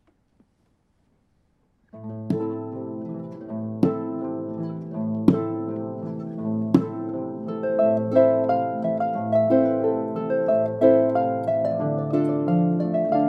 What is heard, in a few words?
cumbia